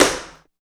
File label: 98 TAP SNARE; East West - Phat & Phunky CD2; 90's Sample CDs